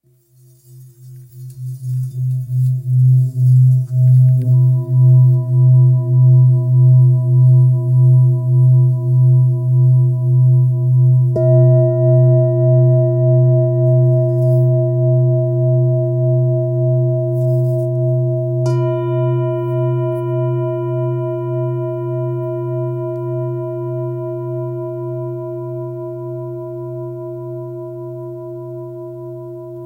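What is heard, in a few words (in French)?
Bol ramgandi si 1.29kg, Bol chantant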